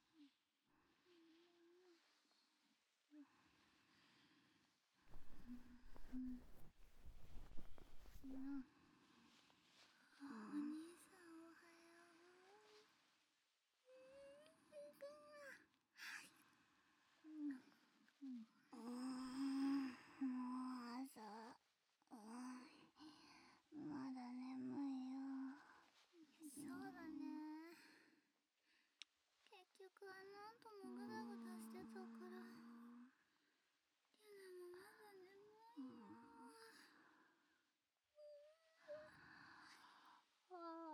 耳舐め, ASMR; 第9章『W耳舐め♡二匹の沼搾精♡』

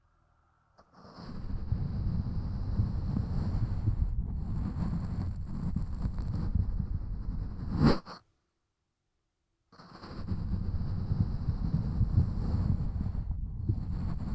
深い綿棒, 効果音素材

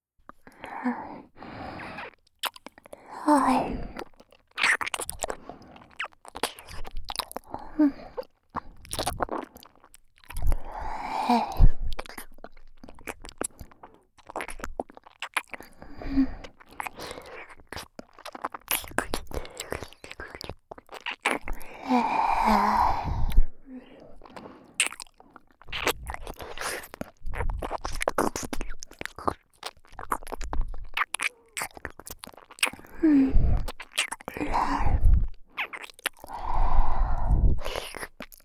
ねっとり耳舐めループトラック; 【フォーリーサウンド】耳かきASMR✕裏オプ援交～人気耳かき店の看板JKとリピ限裏メニューで恋人同棲生活してみたら～

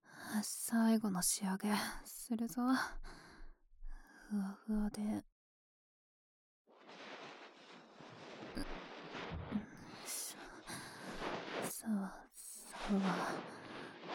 右 梵天, ASMR

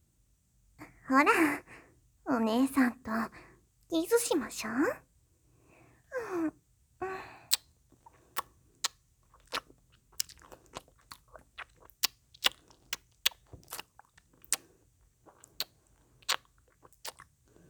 キス; マゾハーレム

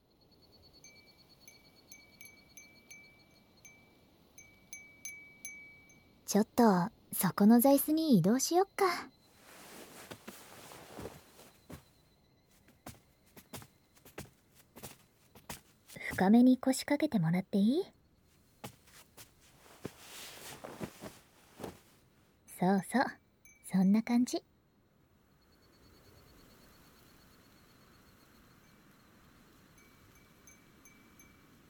指圧でリラックス

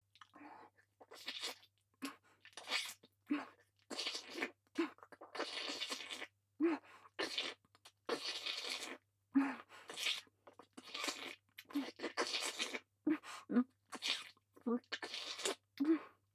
フェラ

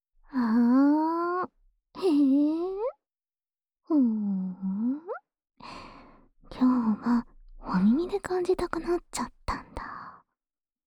安眠用お耳ふーふー 右, ASMR

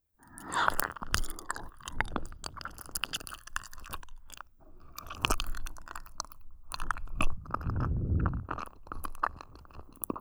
W耳奥舐め回しループトラック, ASMR; お姉さん; 一人で両耳責めしてくるプロ級耳"奥"舐めマスターなお姉さんにお耳の奥の奥までほじほじグポグポされる話; "濡れ"耳かき×耳"奥"舐め

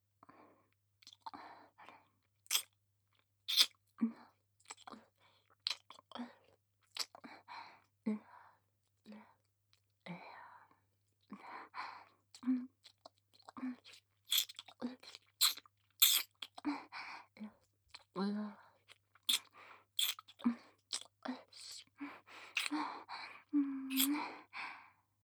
乳首舐め; 癒やしメイドと低音クール女執事の超ドスケベ変態ご奉仕 ～筆おろしからひたすら濃厚溺愛エッチ!～

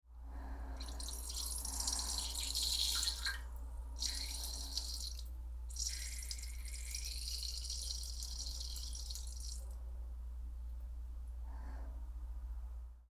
おしっこ しょろろろっしょろろろっしょろろろろ, トイレ盗聴 排泄音; 排泄音集

